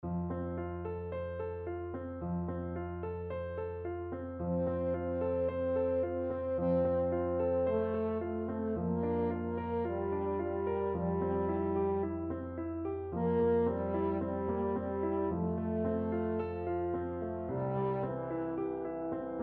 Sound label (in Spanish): duermete mi nino, french horn